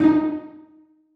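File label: celli pizzicato d#4; Sonatina Symphonic Orchestra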